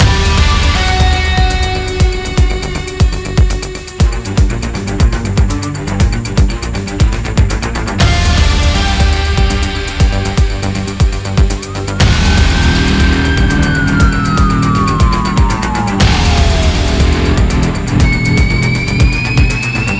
bigwin

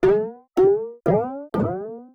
super Mega Win Transition; netent

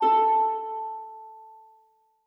melody A4; netent

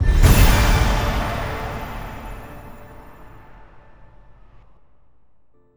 medium Win; netent

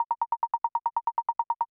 count Up Loop; netent

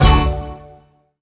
scatter Hit; netent

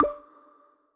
spin button; fruitblaze

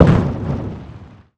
wild Appear After Explosion; netent

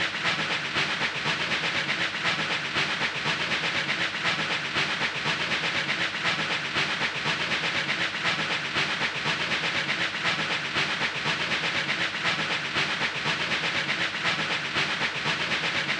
reel train main loop; netent

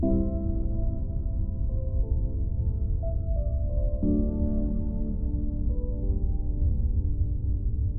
intro splash loop; netent

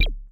spin Button Down

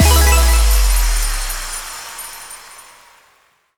win big start snd; netent

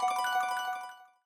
count Up End; netent

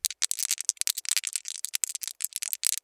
dice shuffle; netent

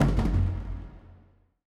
quick stop

